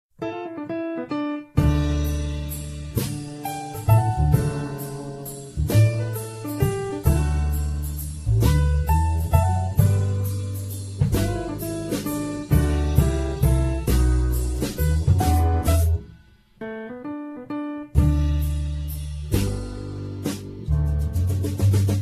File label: Waltz intro